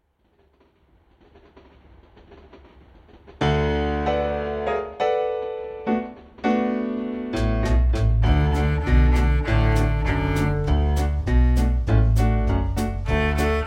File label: rhythm train